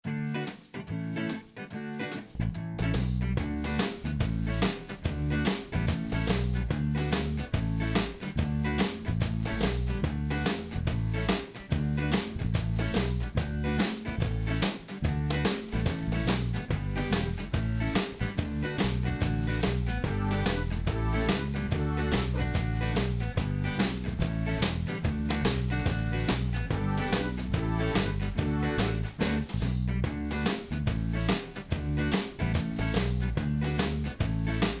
Underlaegnings musik

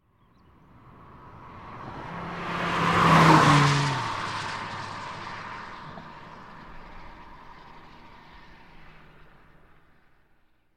Bil forbi hurtig; KØRETØJER

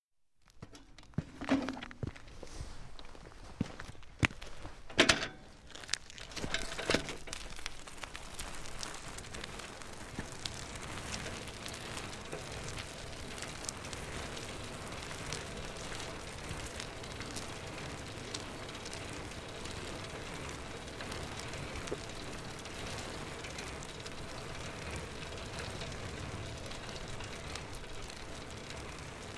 Cykel stige paa koere; KØRETØJER

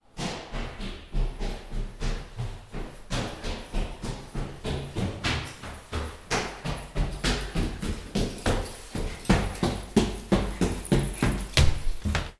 BEVÆGELSE Løbe op af trappen

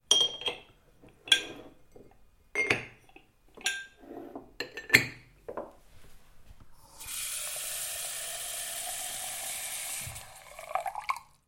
Glas, KØKKEN

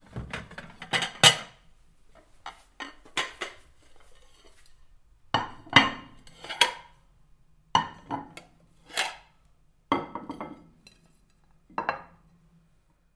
KØKKEN Tallerkner

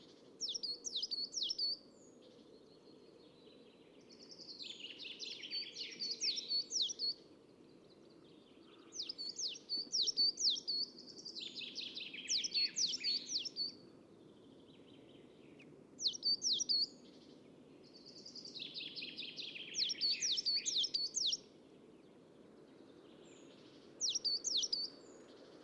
DYR Fugle i haven

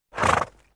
hest prust; DYR

